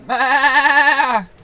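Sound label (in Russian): мужской голос блеет громко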